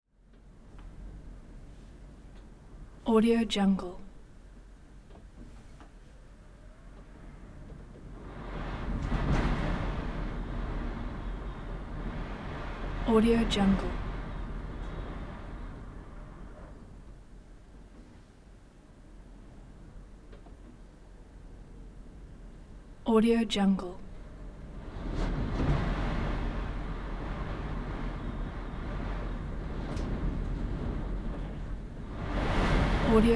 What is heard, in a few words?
wind in bathroom rumbly house shake